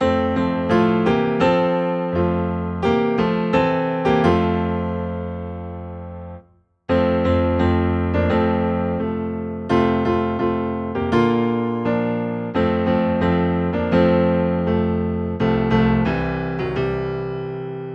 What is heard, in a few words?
Piano Low in F; Bringing in the Sheaves